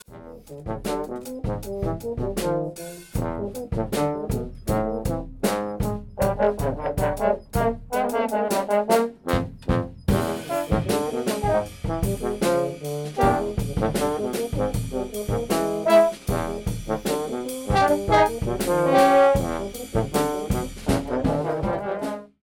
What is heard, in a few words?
low brass plays